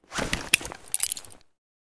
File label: grenade throw quick